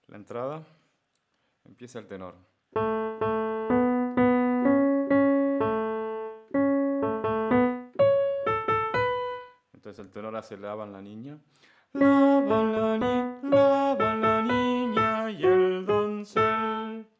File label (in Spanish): En la fuente soprano pasajes